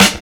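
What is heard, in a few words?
rotten snare; Drums In Your FACE!; 90 s Sample CDs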